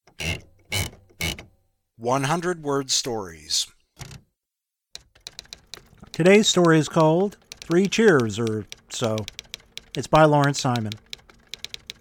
three cheers

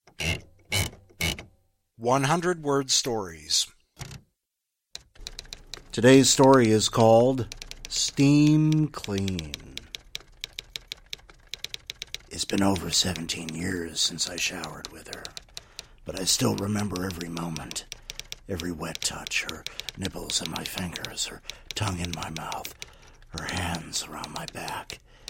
steam clean

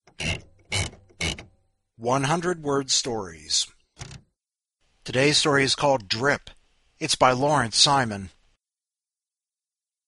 drip

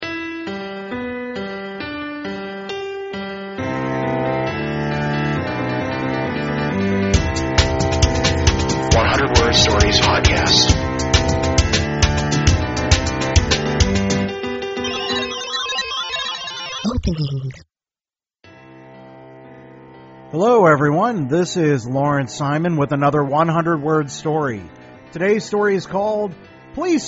trombone

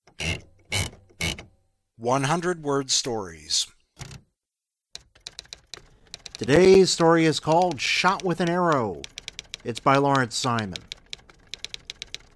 shot with an arrow

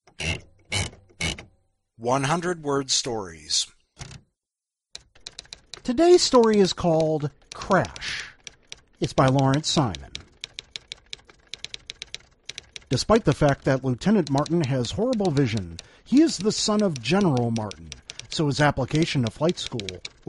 crash

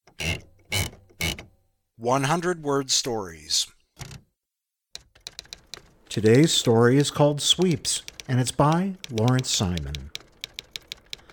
sweeps

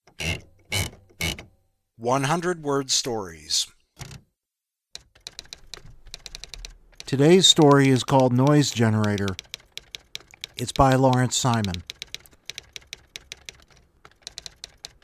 noise generator

